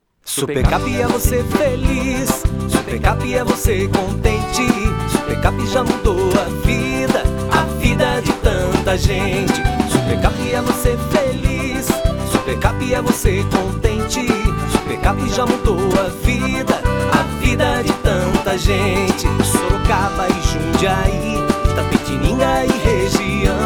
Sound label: Jingle; Jingle supercap 2023 8 anos